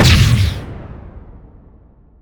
hawk target; weapons; dota; beastmaster

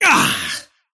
parry fail; dota; kez

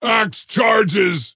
move pain; dota; axe

